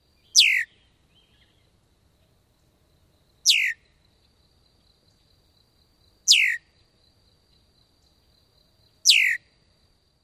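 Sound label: male burry alarm whistles, Red-winged Blackbird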